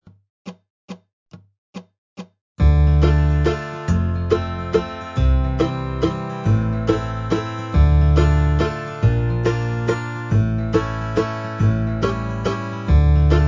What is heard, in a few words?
Greensleeves backing track - 140 BPM, Dobro; Holiday 2025 Dobro Zoom Workshops